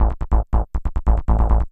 SNTH BASS ELCTR 140 A, Techno

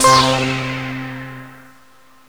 SONIC HIT 3; KillerTweaksII; DirectWave

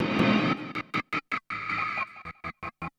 Industrial 160

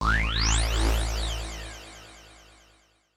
KEY SHOT TEKNO 140 A; Techno2; Singleshots

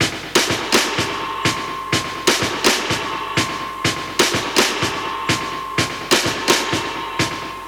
125 Classic Breaks, 125

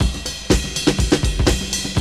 RIDE 122, loop; 122-146 BPM

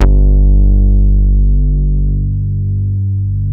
FAT BASS G2, OBMX BASSES

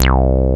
TB BASS; TB-303

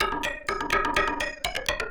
PERCLOOP INDST 125, Industrial Loop

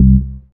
BASS STER.

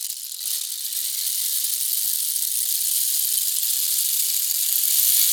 ETHNO EXTRA 90; ethno2

